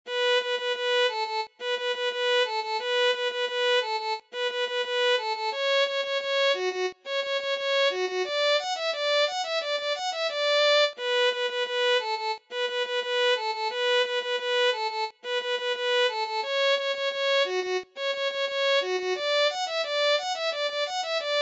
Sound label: Violin